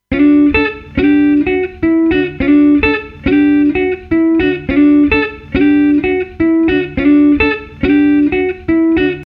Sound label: WES Audio Mimas Clean Electric Guitar Original